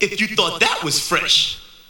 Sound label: VOX GENERAL; Megabass Remix! 2